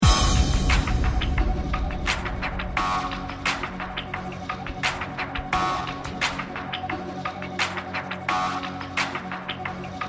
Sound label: Static Chicken